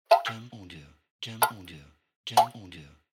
Drops

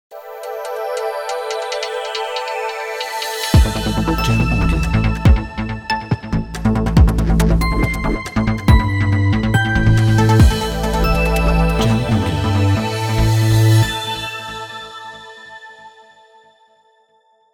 High Tech Logo

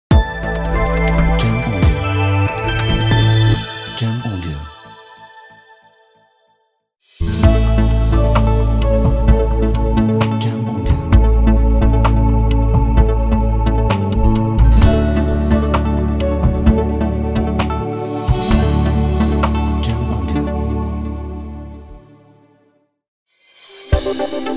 Tech Business Logo; Tech Business Logo Pack

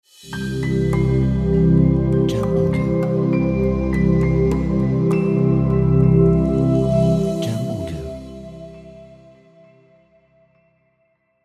Ambient Inspiring Logo

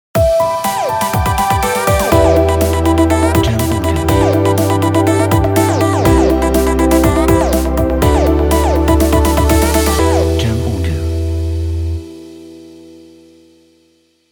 Electronica Energy Logo

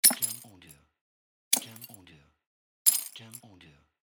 Break Glass

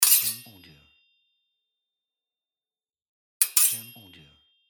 Fencing

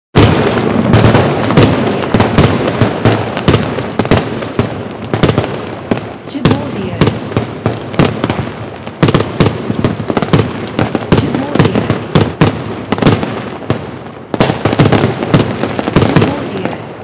Firework

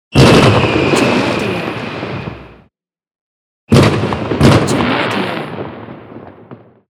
Firework Explosion

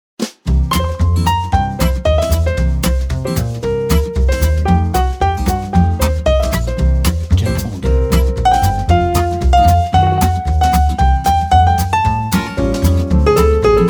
Jazz; Jazz 3 versions Pack

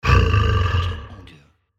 Roaring Lion

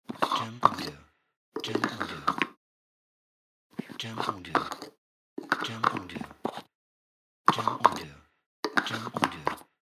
Pill Bottle Screw Lid

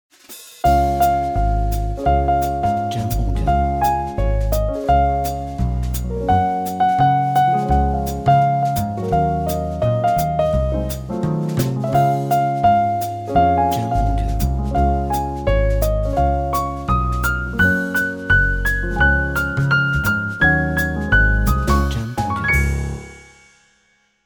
Jingle Bells Jazz Intro

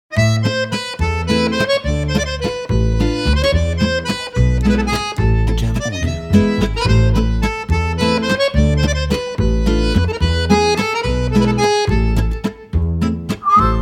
French Accordeon

